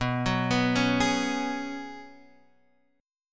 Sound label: C sharp 7th Suspended 4th B